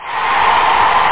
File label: crowd cheer